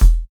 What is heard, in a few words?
short bass drum sound e key; kick drum samples